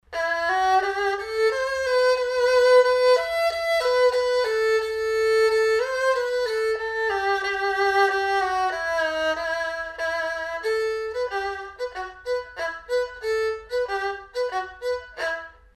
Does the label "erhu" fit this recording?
Yes